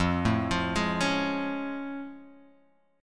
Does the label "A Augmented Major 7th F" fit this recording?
Yes